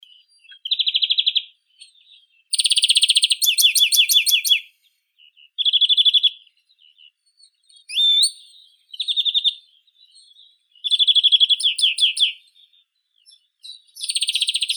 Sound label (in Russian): zelenushka obiknovennaya chloris chloris